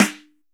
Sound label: SN, Snare; AKAI S6000 CD-ROM - Volume 4